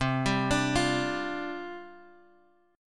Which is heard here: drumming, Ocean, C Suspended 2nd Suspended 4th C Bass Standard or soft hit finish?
C Suspended 2nd Suspended 4th C Bass Standard